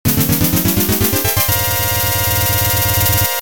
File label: Game Complete; Legendary Axe